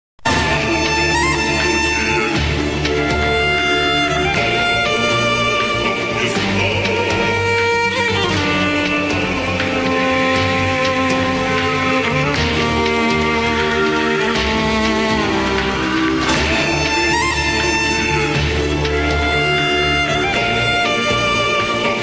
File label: jingle millenium; tf1